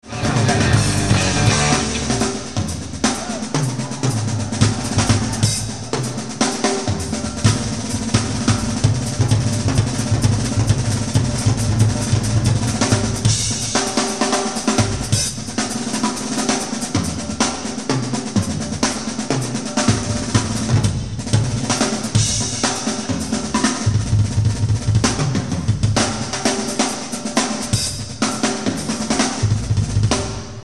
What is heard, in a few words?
drum solo